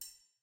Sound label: slider increase down; lost relics